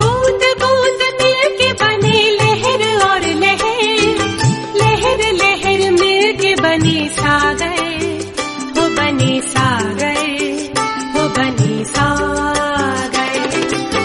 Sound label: Hindi Song Ringtone